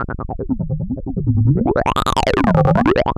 Noise, LFO & Noise

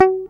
GUI MUTE, E-GUI MUTED

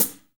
HH hh; Best Service Gigapack 2

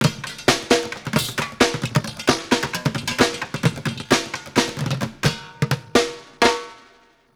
Street Groove, Ambient Grooves; Sonic Foundry (Sony Creative Software)

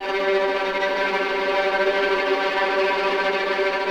VI TREM F; AKAI Advance Orchestra Upgrade 97 Vol.1

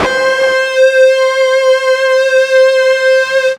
FUZZ C 4, ULTRA FUZZ, Guitar; East West; Steve Stevens Guitar Samples Collection

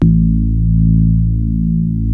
DX PIANO; East West; Twisted Textures-BT

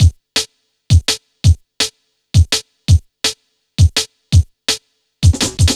Build Up, Jungle, Drum Loops 166.5; Zero-G Jungle Warfare 1, 2 & 3

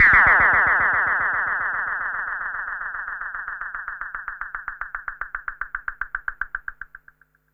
Perc Synth Moog Delay

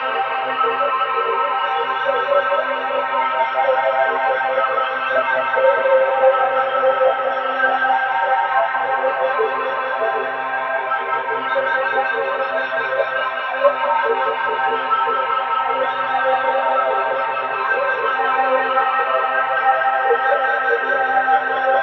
texture swirly C, One Shot Textures; Transmission-X

